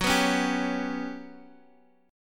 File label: G Major 7th Flat 5th Gb